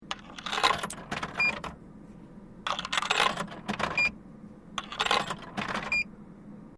Vending Machine Coins Insert, Japanese vending machine; Machinery; Sound Effects:Machines